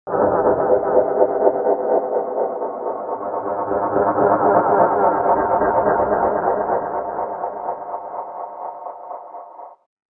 Scary Motion Caught In The Picture, scary soundscape; horror; Andrew Fleming